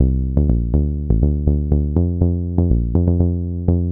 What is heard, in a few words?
122 BPM Bass Synth A maj G min Tonic F; Pro Bass Synth Studio Kit; MMB